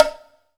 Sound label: Bongo D, Pecussion Hits; Zero-G Jungle Warfare 1, 2 & 3; 90 s Sample CDs